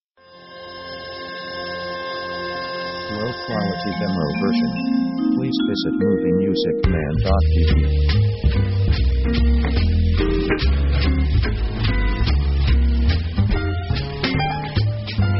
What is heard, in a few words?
swing blues